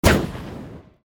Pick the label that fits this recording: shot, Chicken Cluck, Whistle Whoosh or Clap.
shot